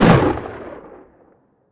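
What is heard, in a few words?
shotgun exterior shot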